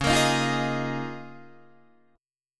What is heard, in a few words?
C sharp 6th Add 9th C sharp down Guitar Open D